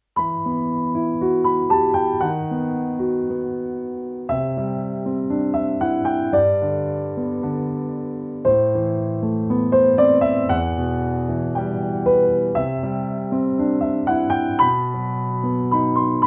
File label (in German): Ein Tango voll Eleganz, Endtempo